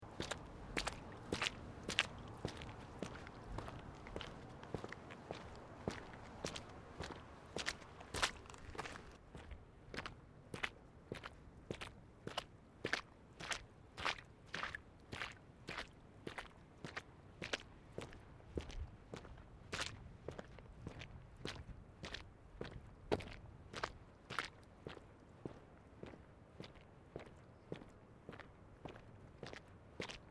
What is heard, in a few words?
Footsteps Boots Asphelt Puddles Sequence; Footstep Foley; Human